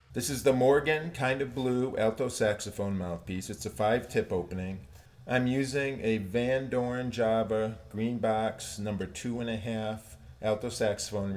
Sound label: Less Reverb, alto saxophone; alto saxophone mouthpiece; Morgan KOB; cannonball adderley alto saxophone mouthpiece